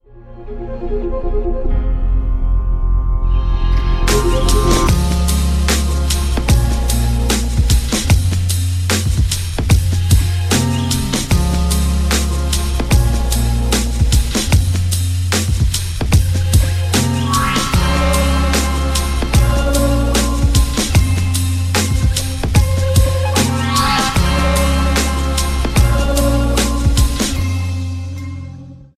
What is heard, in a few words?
Deep Trippy Hip Hop